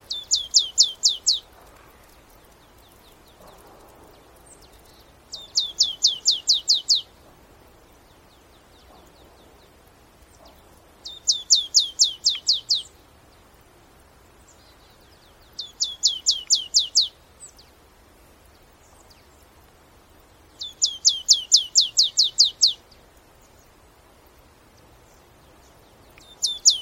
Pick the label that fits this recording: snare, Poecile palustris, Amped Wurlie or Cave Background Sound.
Poecile palustris